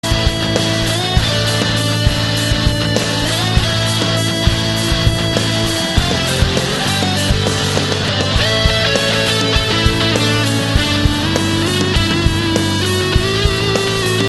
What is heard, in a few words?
ringtone; vivo y 35